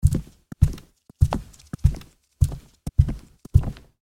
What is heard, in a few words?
Socks fast walk on wooden surface